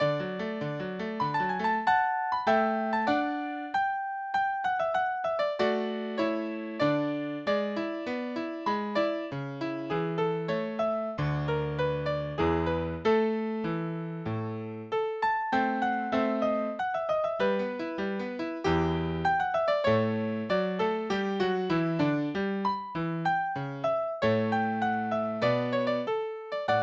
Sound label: waltz